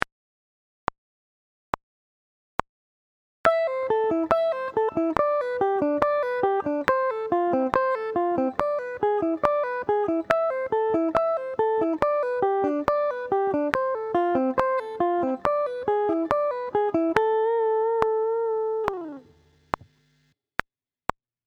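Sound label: Sweep Picking, guitar; Sweep Picking Part 3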